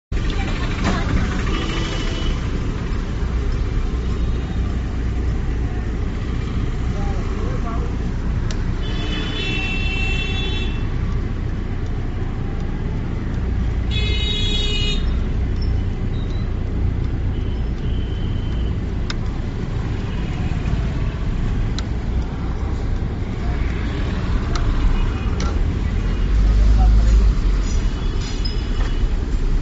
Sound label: traffic sound effects